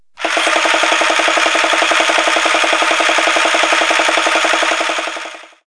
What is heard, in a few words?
TOY CAR; HOUSE; FUN